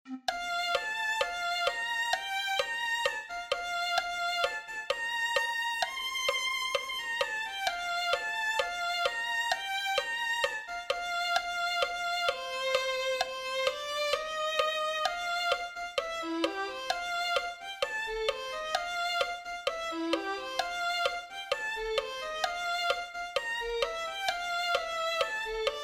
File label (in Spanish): violin1